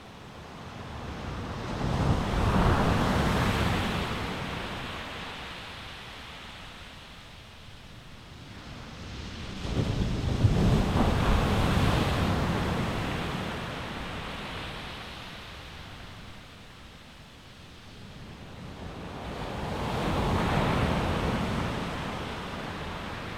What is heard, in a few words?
waves ambience brittany